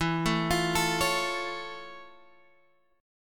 F Augmented Major 7th E

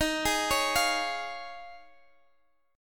D sharp 7th Suspended 2nd Suspended 4th; D#7sus2sus4; arpeggiated

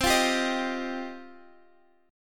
F 7th Suspended 2nd C

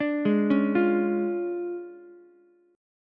D Minor Major 7th Flat 5th Ukulele, chord; DmM7b5; arpeggiated; JGuitar

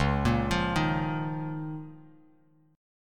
C sharp 6th Add 9th, Bass Standard; arpeggiated; C#6add9; 9,13,15,8; JGuitar

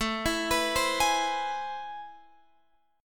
A Minor Major 9th, down, Guitar, Open F; arpeggiated; JGuitar

